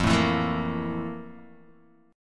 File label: F Major 11th Guitar DADGAD